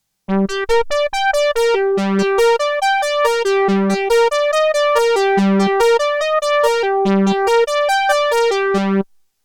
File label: Marion Systems MSR2 Polysaw